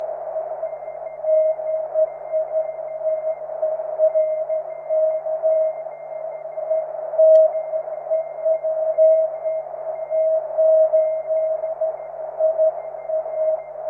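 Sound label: cw, 23 cm EME; ja8iad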